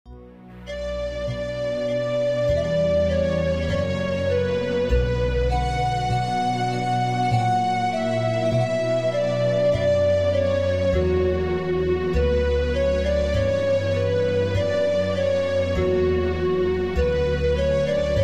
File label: Largo From Concerto Flute